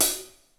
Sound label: STEREO DRUMS